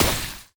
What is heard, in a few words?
Enemy Attack Puddle Hit; bloom minigame